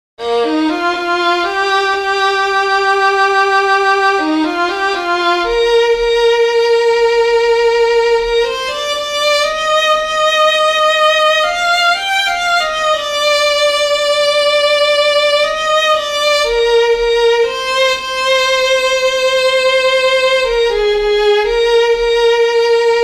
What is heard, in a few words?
violin solo